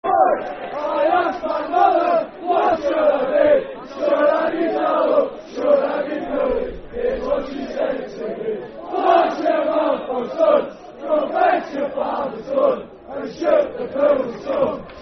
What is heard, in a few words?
When I Was Just A Little Boy, Football Chant (Soccer Songs); Darlington Dfc